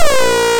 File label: FIX SYNC, ARP Synths; Analog Odyssey; E-MU Formula 4000 Series Vol. 3 – Analog Odyssey